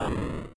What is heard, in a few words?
boom; asteroids